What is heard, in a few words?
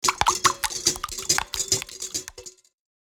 Steel whisk egg beating; household